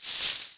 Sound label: Herb Shake; Levels Hub Restaurant; Act Kitchen